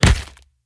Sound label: punch hit